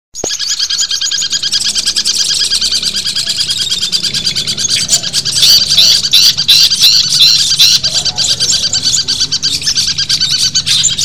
simulasi lomba cendet

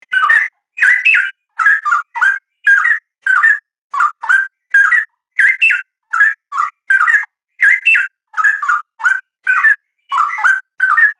suara burung cucakrowo nglagu

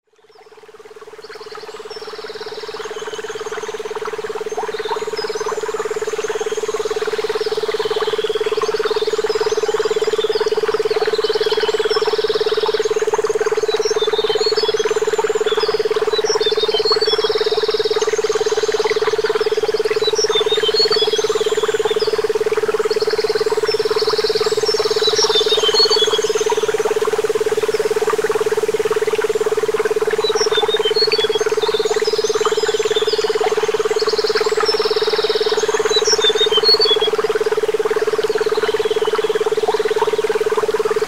terapi brainwave

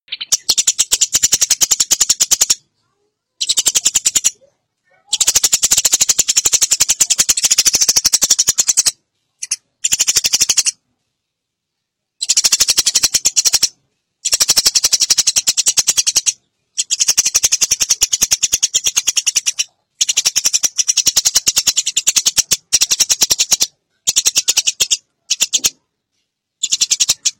suara gereja

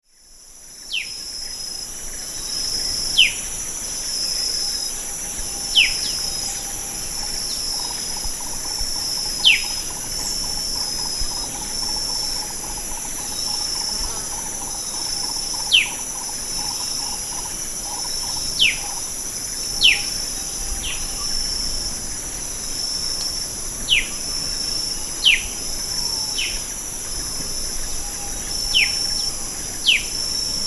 burung cep cep atau cucak kurincang

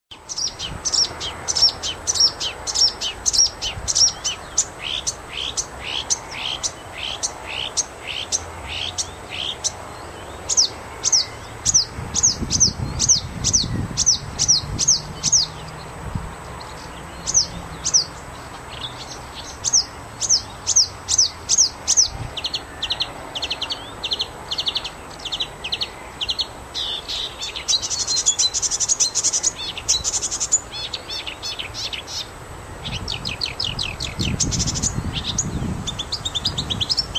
suara ciblek hutan

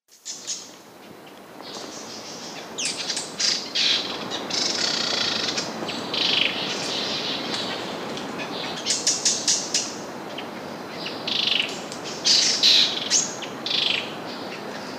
tledekan gunung betina

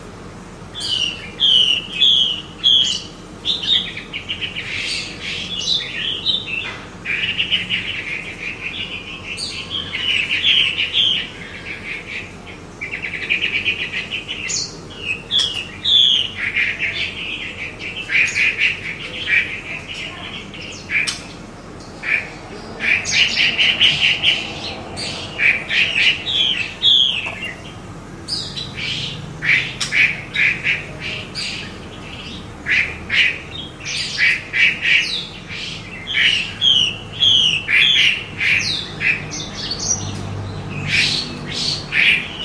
hwamei betina

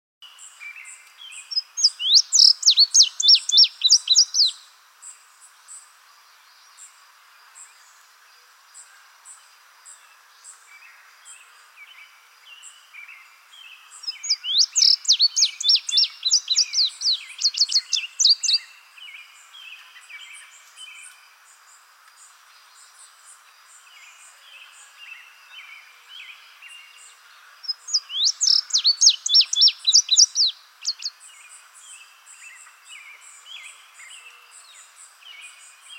suara kicauan burung indigo bunting

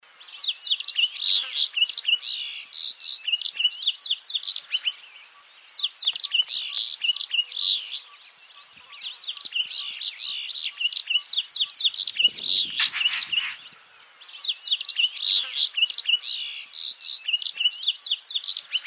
kenari brimstone

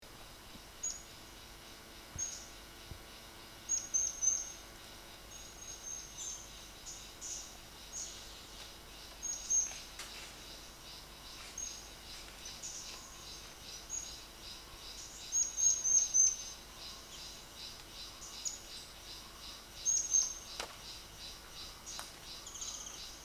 burung murai besi murai cai murai air mancur

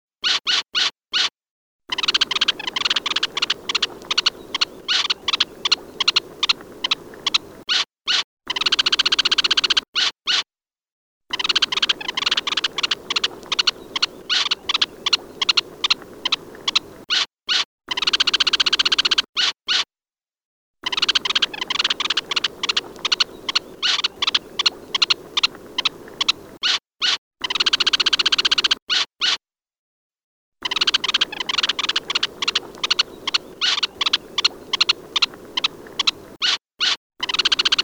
suara kicauan burung red necked phalarope

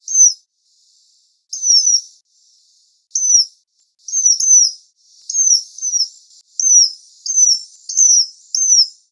perling ekor panjang call

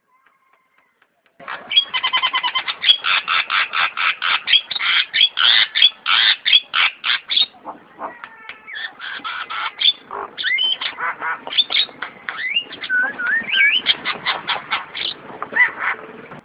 jalak kerbau

